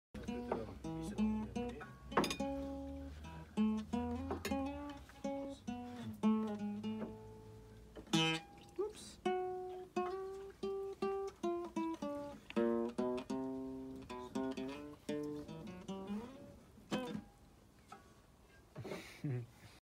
dombra

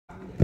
Tabla

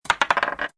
rpg dice rolling

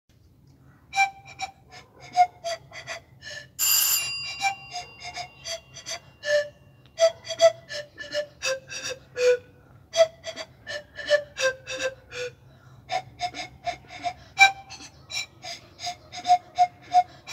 Pan flute

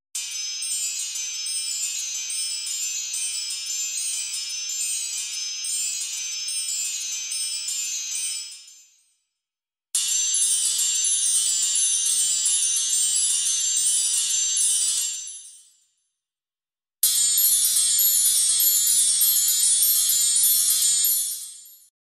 Wind Chimes

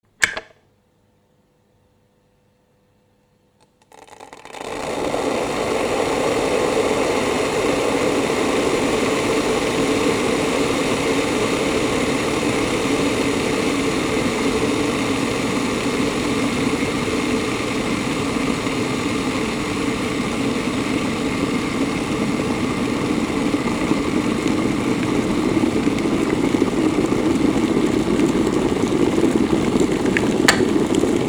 electric kettle, to steam

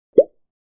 Pop up

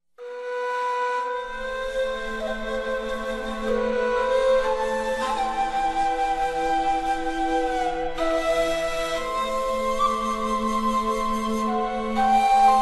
Sad Fate; Jumong OST

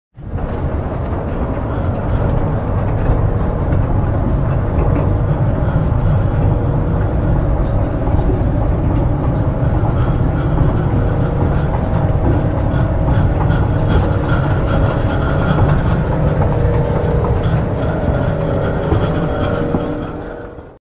Escalator

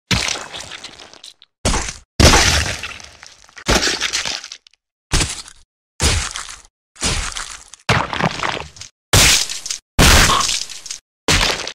Bleeding

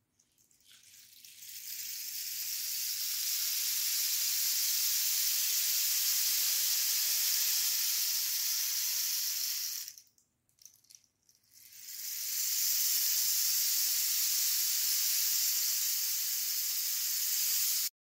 Rainsticks

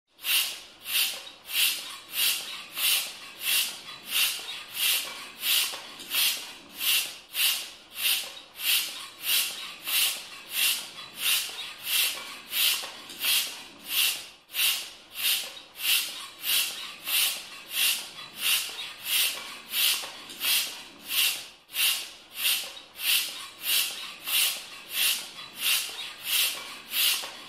hand pump

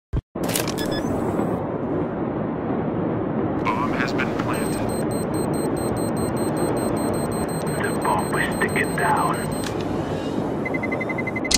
Terrorist win; Viennese terrorist

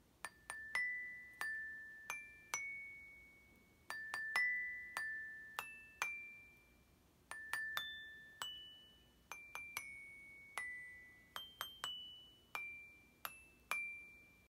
Bells, bells instrument

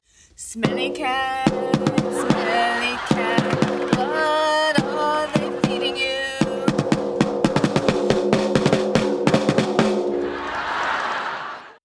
Drum Version

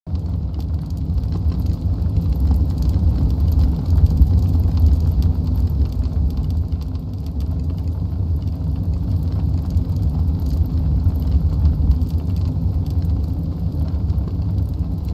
Heater

